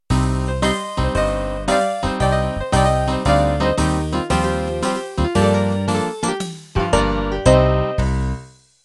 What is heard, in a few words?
Country Ballad End